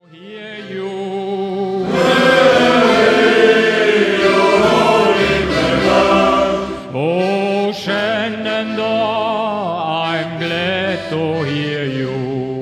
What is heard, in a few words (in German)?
Shanty Chor